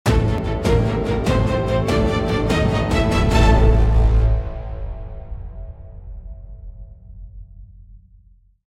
Epic cinematic build swell riser

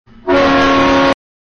Loud train horn blast meme

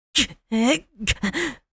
Effort grunt anime boy vocalization

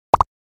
Cartoon accent popping

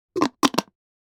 Skin care cream jar close

